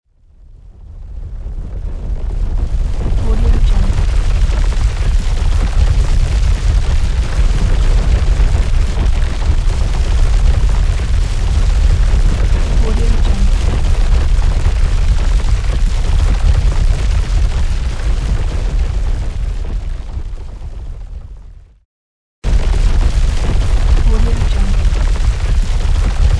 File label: earthquake